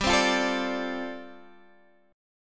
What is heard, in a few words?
G 7th Suspended 2nd Suspended 4th, Guitar Standard; G7sus2sus4 strummed